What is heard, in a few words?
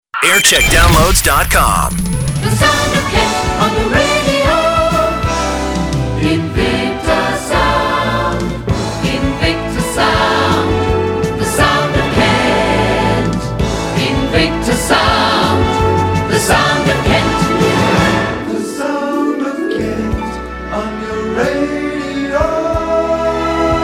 jingle montage; invicta